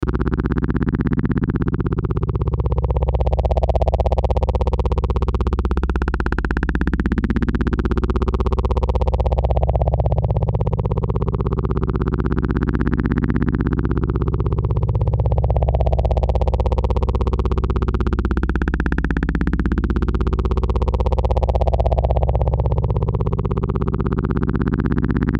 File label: Chopper Low Click Flange Swells; Blastwave; Chopper Sound Effects